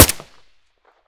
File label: ak74u silencer stereo; STALKER; IWP